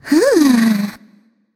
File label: ugh; Smite; bastet